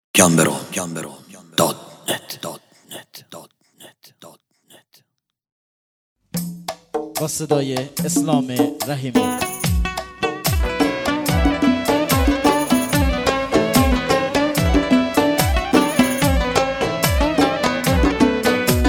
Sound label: Oud; Hafle